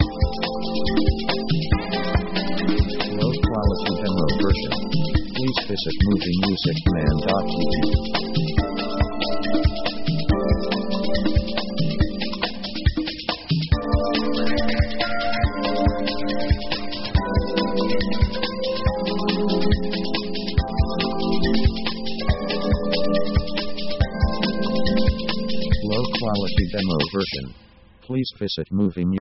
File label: jazz sweet dreams sleepsong